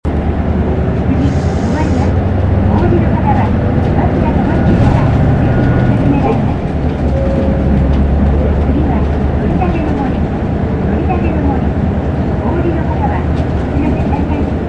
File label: bus tape orgel; aichi